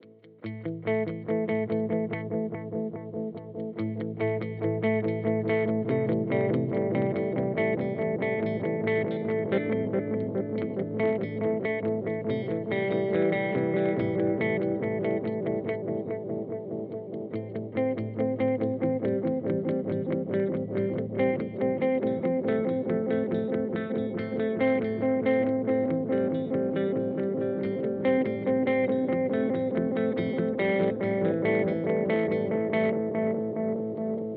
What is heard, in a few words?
JHS Panther Cub V2